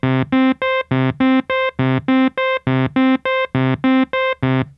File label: Nord Lead 4 arpeggiator